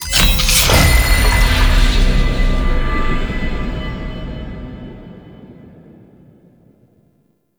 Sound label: Cet Invasion Mission Start Transition; Warframe; Cetus